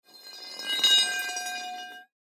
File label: ground scrape